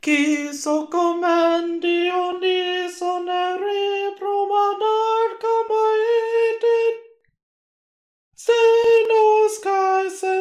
Hymn to Dionysos Soprano

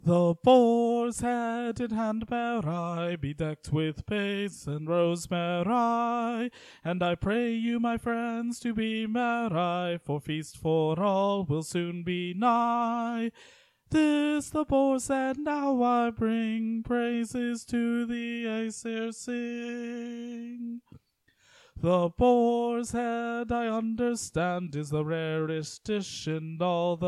The Boars Head Carol tenor

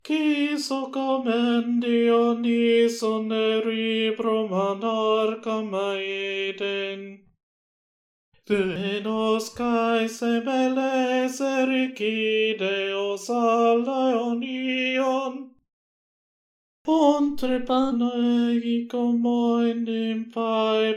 Hymn to Dionysos Tenor

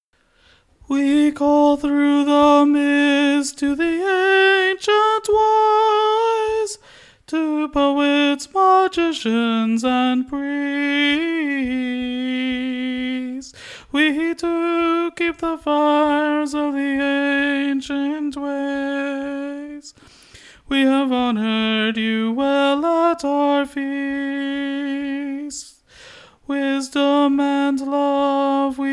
hymn alto; hymn to the ancient wise

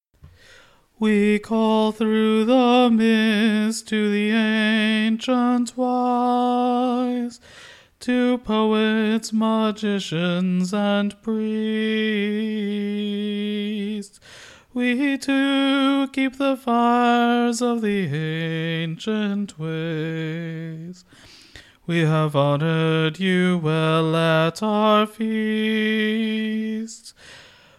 Hymn to the Ancient Wise Tenor

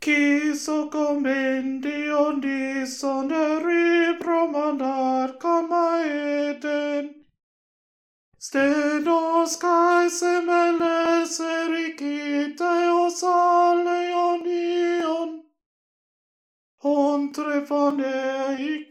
Hymn to Dionysos Alto

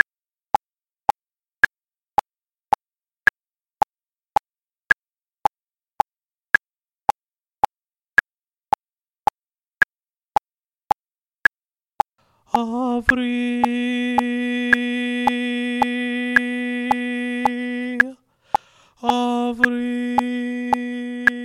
hymn tenor; hymn to brighid

